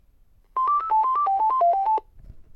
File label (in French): Telephone GIGASET DA710 Sonnerie